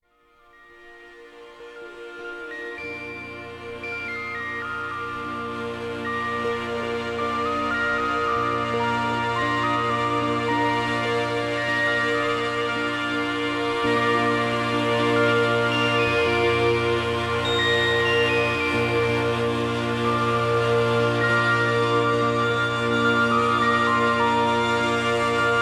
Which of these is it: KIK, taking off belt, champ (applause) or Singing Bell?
Singing Bell